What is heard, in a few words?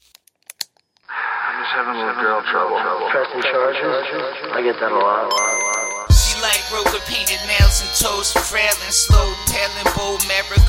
wild flower rap